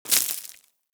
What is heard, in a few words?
Bullet Impact Debris Dirt